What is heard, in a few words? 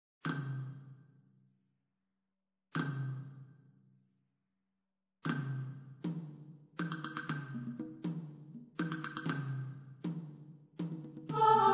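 soprano 2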